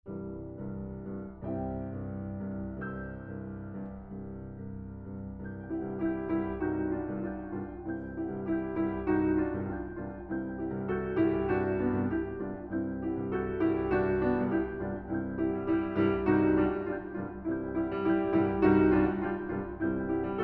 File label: Congada